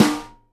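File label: snare nylon damp, Live Snares; E-MU Producer Series Vol. 8 – Platinum Phatt (CD 1)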